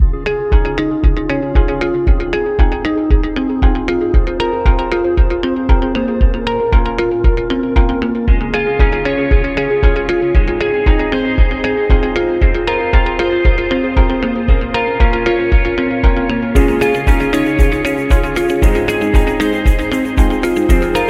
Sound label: Corporate Medical; business background